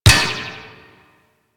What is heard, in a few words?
Ball Bounce Metal; Warframe; Missions; Tenno Ball